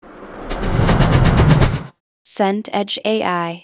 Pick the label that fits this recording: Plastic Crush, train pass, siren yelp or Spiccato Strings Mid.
train pass